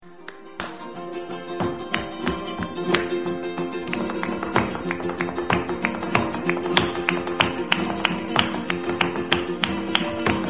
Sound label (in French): espagne flamenco